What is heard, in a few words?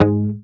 HAMER MUTES, Bass